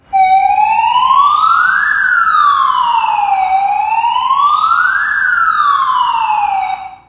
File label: flight crash; LPD27